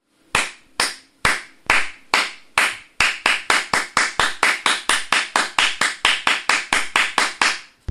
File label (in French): claque